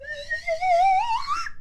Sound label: Wobble Rise, slide whistle